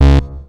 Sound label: 106 Bass C#2, One Shot Bass